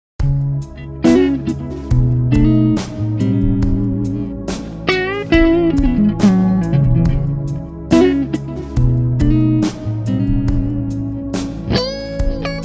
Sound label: Mercury EL34 Stratocaster